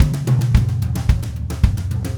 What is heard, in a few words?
Red Maple Groove 110, DW Red Maple Kit